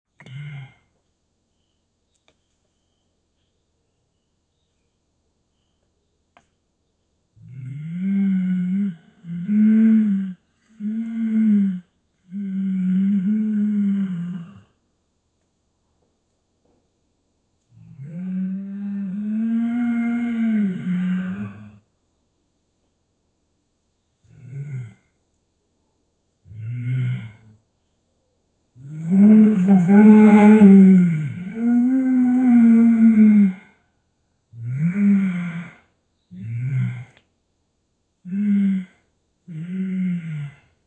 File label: cow gives birth